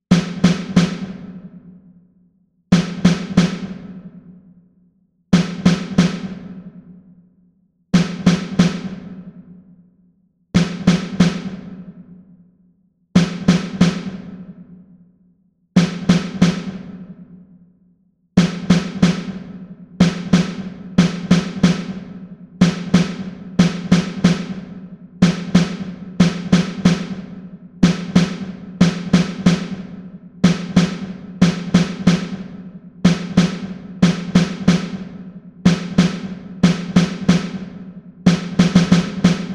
Toque - Repique; fanfarra

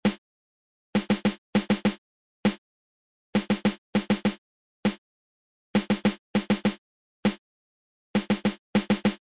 Toque - Repique; fanfarra

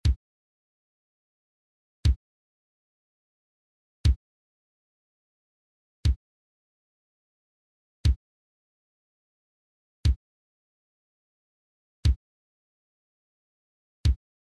Toque - Bumbo; fanfarra

